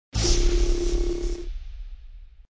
Shocker Shot; bobtherobber2

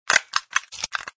Unlock; bobtherobber2

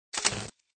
Cable Cut; bobtherobber2

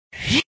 Apperture Move; bobtherobber2

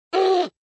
Security Out; bobtherobber2

